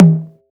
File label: Tom Mid 808; 808Flex; POMMESFRITES